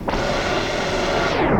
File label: Industrial